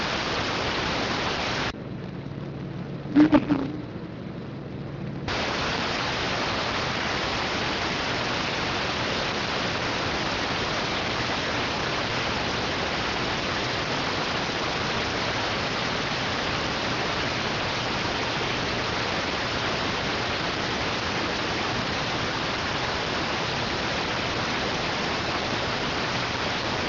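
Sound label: radioteletype receive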